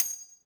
casing bounce soft

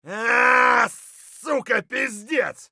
hurt light; Escape from Tarkov

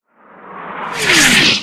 artillery incoming; Call of Duty Modern Warfare

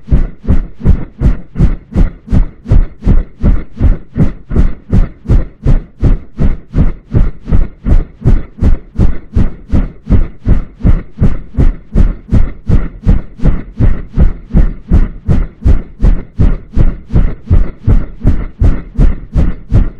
Aircrafts Engines Rotor Mi8 MTV2; DCS

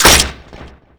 claw connect hard

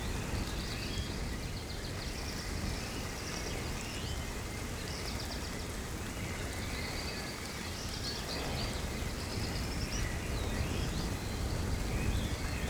ambience forest day breeze drizzle; Rust

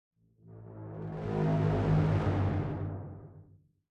music interactive; World of Tanks

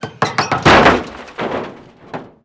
sheet wreck open; ModernWarfare

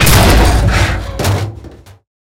tank impact tank

